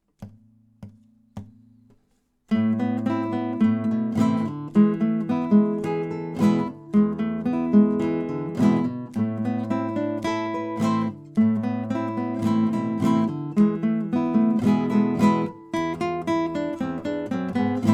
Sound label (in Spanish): cuerdas duet; 2 Git. leicht; 2 Gitarren